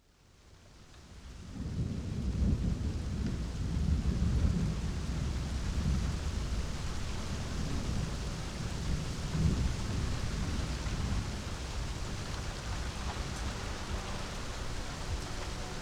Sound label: Deep Rest Rain Sleep